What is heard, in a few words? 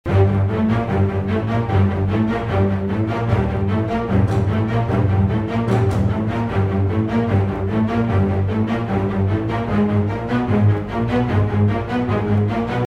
orchestration doublings thick strings, the whole and "thick" string section; epic orchestral track